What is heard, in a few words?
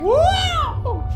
woaww